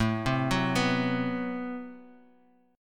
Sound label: A Minor 6th Add 9th A Bass Standard, Chord; Am6add9 arpeggiated; 17,15,16,16; JGuitar